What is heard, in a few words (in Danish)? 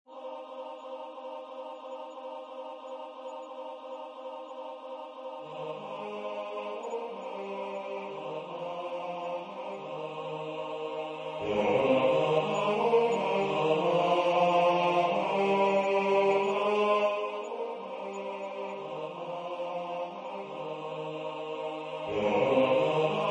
bas